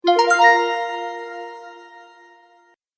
News Message, Cartoon; Cartoon Sound Design Sound Effects

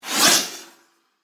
Fire, Firework, Bottle, Rocket; Away; Firework Sound Effects

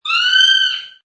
Kiwi call, Exotic bird - New Zealand bird; "Kiwi" calling; Bird Sound Effects; Lynne Music

